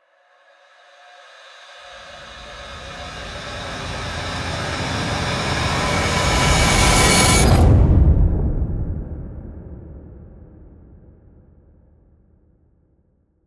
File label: ANALOG SUSPENDED LONG BRIGHT RISE; WNF ANALOG LIBRARY